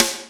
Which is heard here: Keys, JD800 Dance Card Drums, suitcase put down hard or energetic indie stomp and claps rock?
JD800 Dance Card Drums